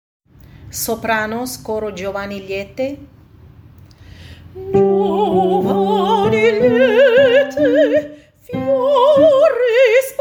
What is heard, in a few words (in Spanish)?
sopranos